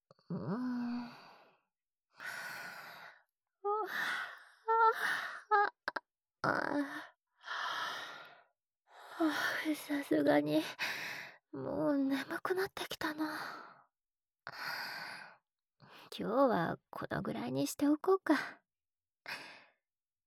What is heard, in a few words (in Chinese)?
安睡用・哄睡音轨（摇篮曲・心跳声）